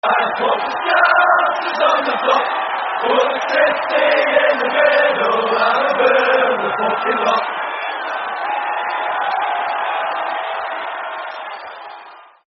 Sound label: Bonfire (With Crowd), terrace chants; Man United